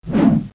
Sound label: Whoosh